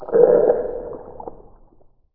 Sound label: damage; Barotrauma; Barotraumatic; Coelanth